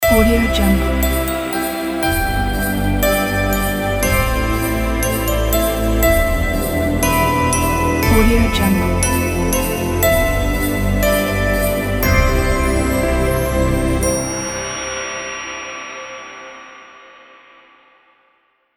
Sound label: magical christmas logo